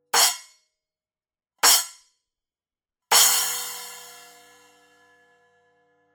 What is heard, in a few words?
10 I Splash crash choke, cymbal sound